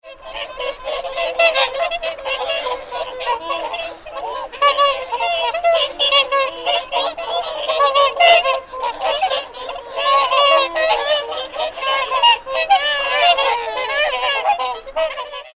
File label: whooper swans caerlaverock